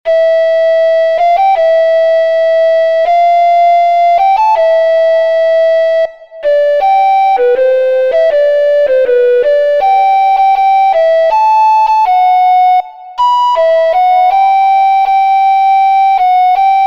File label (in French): Sonate, flute